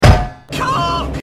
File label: hit head; bmw isetta